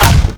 melee tonfa; weapons

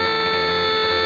servo; KSP; Romfarer

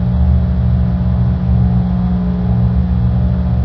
indoor, ambient atmosphere

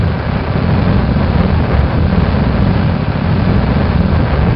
sound rocket spurts; KSP; Squad

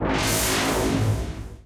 bon armor re; AssaultCube; Alien Stars

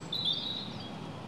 birds 1shot; ambient; animal; Counter-Strike Global Offensive

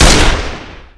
pistol dual fire; weapons, gunfire

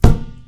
rubber tire impact hard; physics; Garry's Mod; hl2

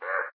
idle, crow; Garry's Mod; hl2

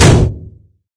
clang, materials; Garry's Mod; hl2

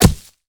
outgoing arrow hit ground; Mount&Blade Warband

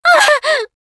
scream while pounced

